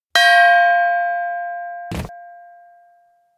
start gong; anemoi

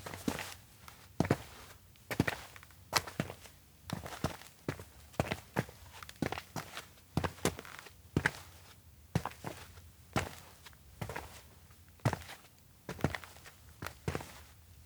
steps outdoor road walk silent; LOOP; anemoi

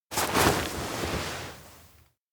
crouch slow; koyomi; anemoi パッケージ版

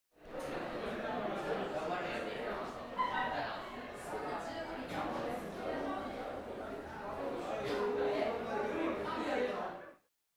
indoor chatter; anemoi

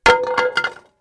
Pizza peel; anemoi

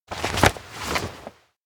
note close stand; anemoi

